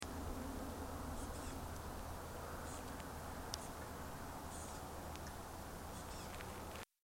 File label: chickadee